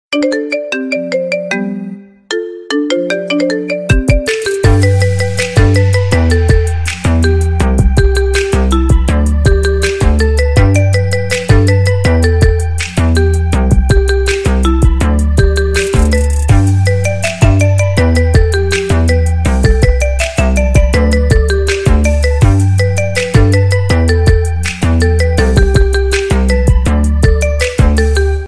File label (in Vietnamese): Marimba Remix Nhac Chuong